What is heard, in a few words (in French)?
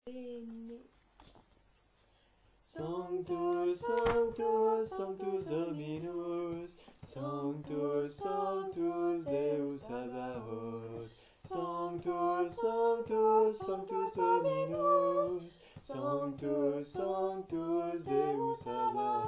Voix de soprane